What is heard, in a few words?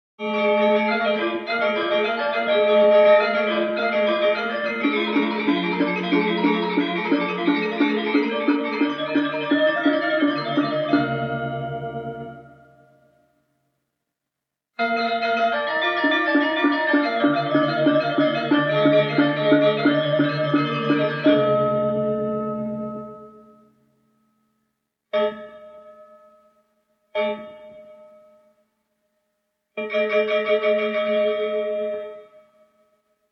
Balinese Gamelan Gong Kebyar